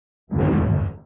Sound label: jump